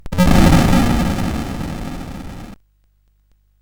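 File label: Moon Patrol, MOS 8580 SID chip music, games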